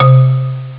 holz bell; ST-XX Amiga Soundtracker Sample Packs

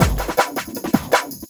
Loop, Drum & Bass, 160 BPM

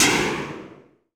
noise fx; Masterbits; Switch FX

